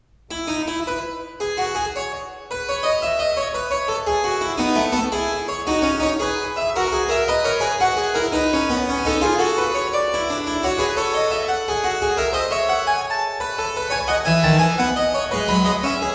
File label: Prelude and Fugue in F Major, Well Tempered Clavier; BWV 880; Johann Sebastian Bach